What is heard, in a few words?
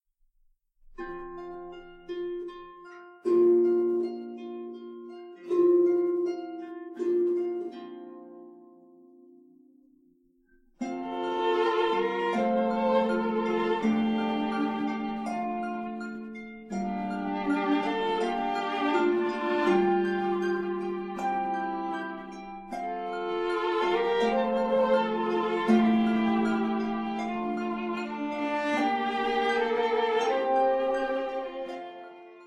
bleak celtic violins